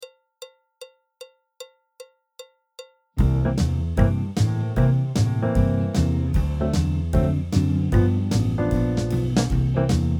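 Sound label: backing track, Jazz; clarinet, flute, trumpet; Jazz Syllabus Level 2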